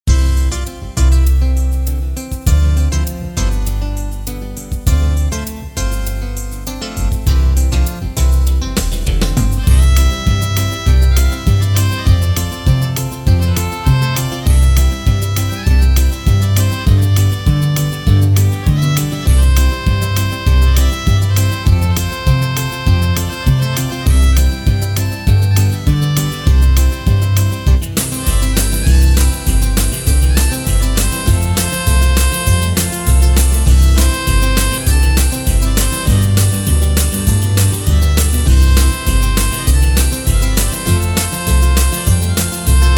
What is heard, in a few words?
Country, Casio CTK-601 Rhythms